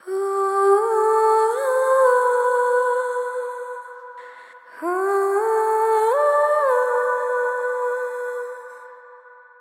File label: Vocal Swell